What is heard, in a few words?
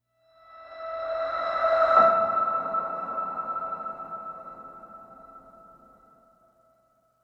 piano ping rev E